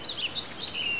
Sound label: BIRD; animal